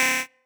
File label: marimba bike